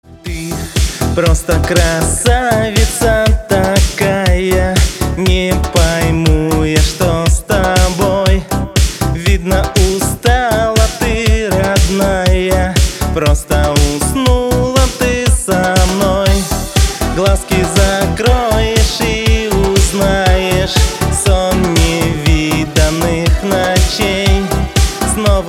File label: retro vintage mix